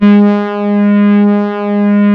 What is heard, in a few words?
SOFT HI G2; OBMX-SYNTHS